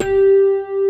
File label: RHODES; Pro-Rec - Synthsonic